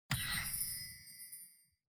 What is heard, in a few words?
spell removefrombook; baldursgate